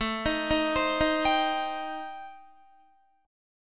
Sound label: A-Minor 7th Flat 5th, Guitar, chord; Am7b5; arpeggiated